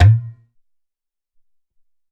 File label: Bayan